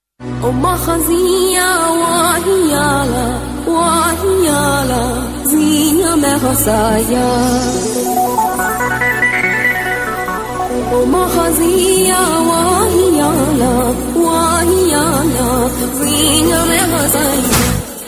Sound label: sweet arabic tone, ringtone